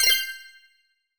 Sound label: confirm, tones; inteface; inteface sfx pack 1